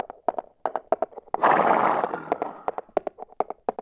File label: hooves; DAoC